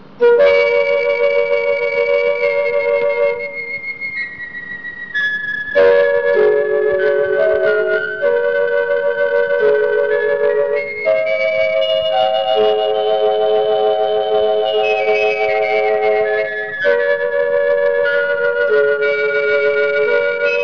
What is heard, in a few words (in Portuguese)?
orgao